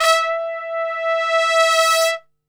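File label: E 3 trumpet, Brass Super Section; Best Service; TRP SWL; 90 s Sample CDs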